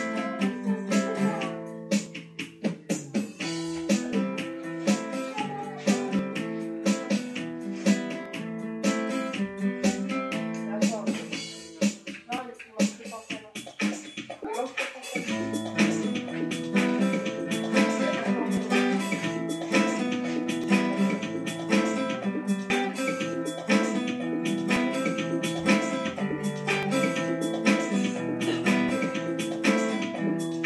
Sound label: Piano angel Guitar